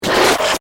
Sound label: Flash Back Fast; Hits; Sound Design; Blast Wave